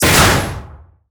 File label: Weapons Missile Launch In; DCS